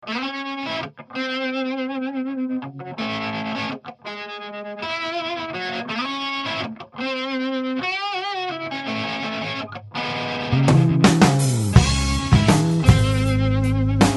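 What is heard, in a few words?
guitar ultimate; ringtones; rock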